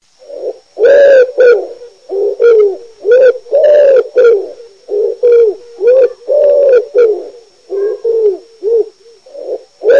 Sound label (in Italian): COLOMBACCIO